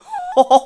"Hoho"; Sahasrahla: "Hoho!"; zelda

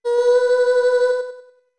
Notes Voice B long; OOT

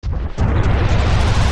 Roll Slow Start; Goron Link; Zelda; MM

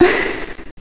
Exhale; Zelda exhaling

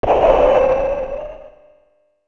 Smashed

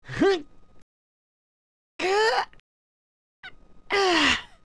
Yawn Full; Adult Link

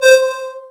Triforce Gateway; Stepping on a Triforce Gateway (3 tones)

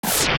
Ball Fizzle; Phantom Ganon; OOT

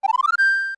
Get Item; Get an inventory item

